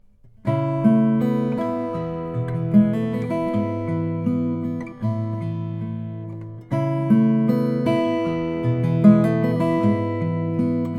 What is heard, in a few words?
elysian e13 madagascar rosewood